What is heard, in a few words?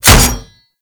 Berserker Barrage Hit Metal B; Warframe; Warframes